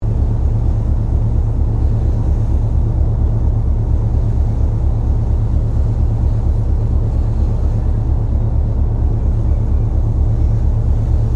Boat Ferry Freshwater Class Sydney Engine Ambience Stereo; Manly Ferry